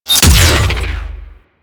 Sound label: Beam Cast; Warframe; Corpus; Corpus Railjack; Admiral Agent